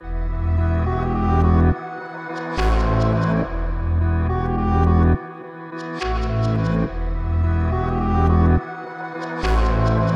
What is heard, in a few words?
140 BPM E Min, Melody, Drill; Cymatics; 2022 Melody Collection